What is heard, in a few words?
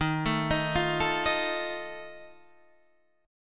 D sharp Suspended 2nd Flat 5th, chord